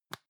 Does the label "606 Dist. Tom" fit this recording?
No